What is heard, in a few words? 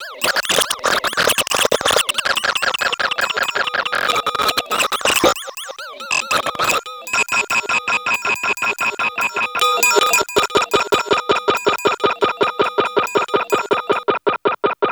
el blurps, special FX twisted; USB Soundscan; AKAI